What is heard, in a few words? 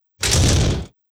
Prison door